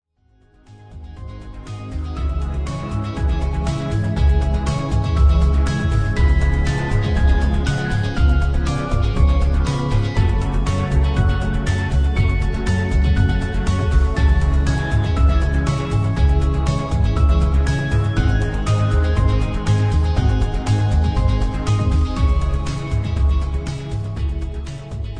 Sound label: industrial combination; tinellimusica